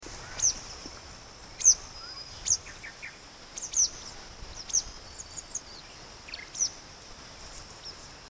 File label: Yellow Wagtail Kitanglad